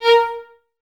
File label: String Ensembles, Violas, VLS Detache